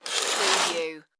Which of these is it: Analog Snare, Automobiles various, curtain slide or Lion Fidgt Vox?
curtain slide